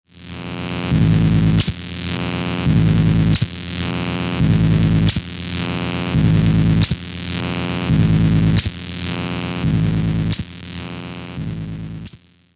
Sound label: destructoll